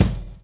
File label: groove; AMG Gota Yashiki Groove Activator